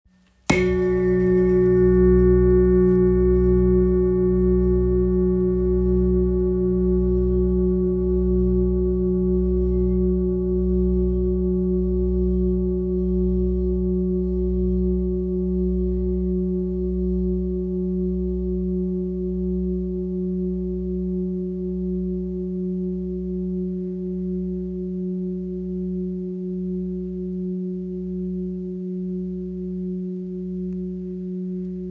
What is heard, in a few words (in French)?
Gros bol gravure pieds si, Bol chantant gros volume plus de 5kg